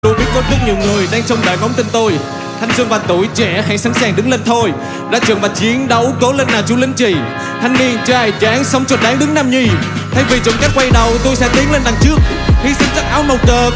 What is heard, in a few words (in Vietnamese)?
Nhac Chuong RAP VIET; nhac tre